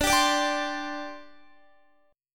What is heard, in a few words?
D 7th Suspended 4th, down, Guitar